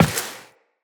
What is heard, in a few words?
Step Default, Footsteps Walk; Tenno